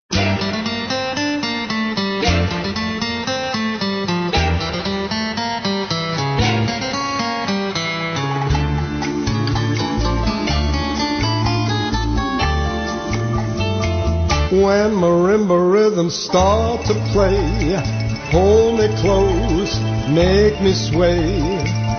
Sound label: Swing